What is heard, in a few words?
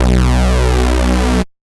Bass Cuts; Vengeance Samples; VES1 Instruments